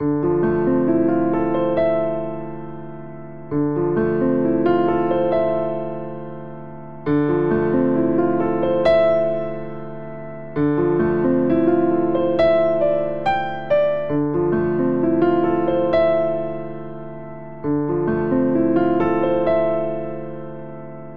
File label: Vamp Arpeggiated Two Hands D Minor 68 bpm, Grand Piano; MIDI Clips; Ableton; Factory Packs